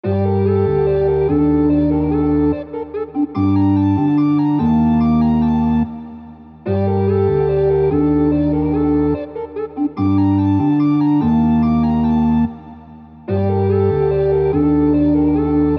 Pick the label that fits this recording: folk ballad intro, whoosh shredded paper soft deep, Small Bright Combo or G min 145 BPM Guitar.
G min 145 BPM Guitar